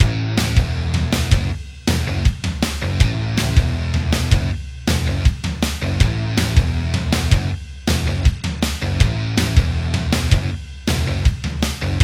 3d Music

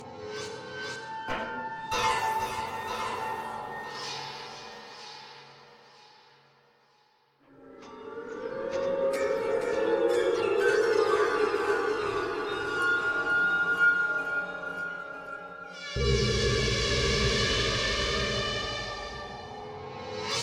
Puzzle Loop; The Vale; prince of persia 2008 gamerip

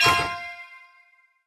Victory Stars

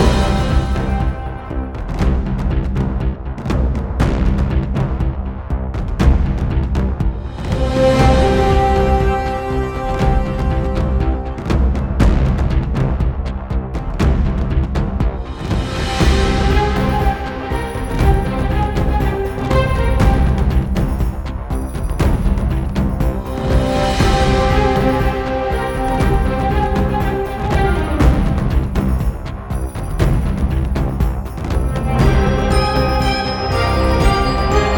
Winter Medium Threat

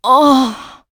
Female Fight Death; mighty party 2017 gamerip pc ios android

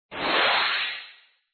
hp bar fill sound; Caravan Beast

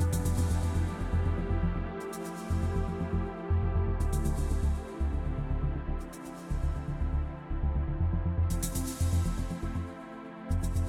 Music Tension

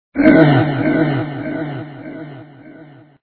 evil die; Dad 'n Me

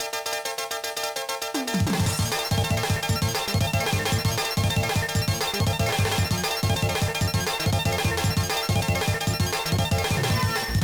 BGM; Custom Robo GX; GBA

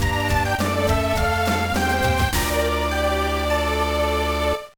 Jingle, soundtrack; Tantei Gakuen Q Kyuukyoku Trick ni Idome 2004 GBA

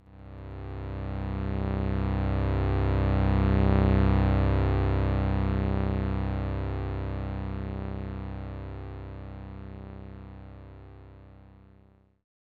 Unknown Jingle; Macross Scrambled Valkyrie; SNES

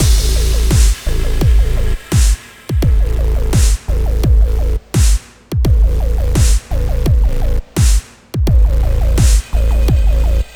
Crush & Contort Battle Loop; Chrono Ark

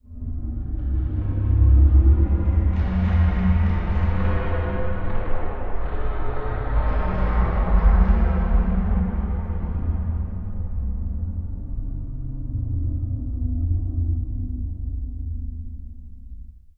Scary; Dark Dimensions: City of Fog

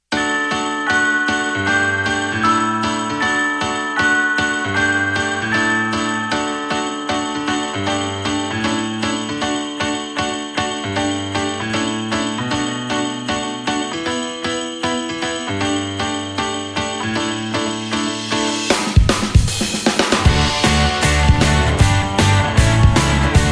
We Wish You A Merry Walrus (Instrumental); club penguin

